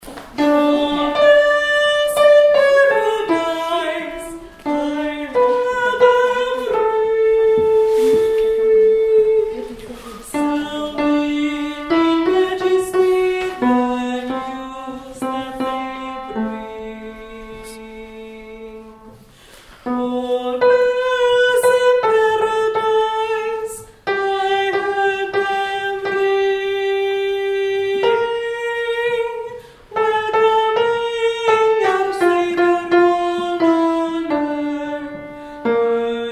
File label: Bells; Gospel